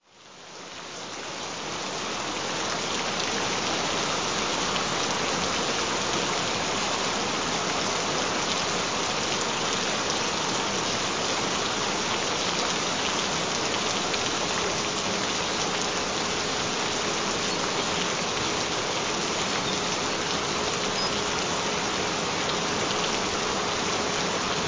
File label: Solfeggio Frequencies Gentle Rain Sounds; Ultimate Flusher; Blockage Removal